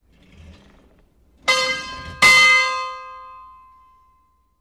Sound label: Small New England, bell towers